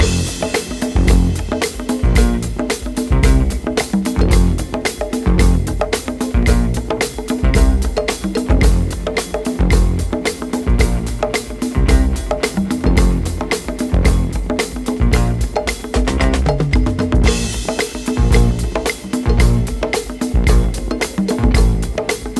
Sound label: Instrumental Groove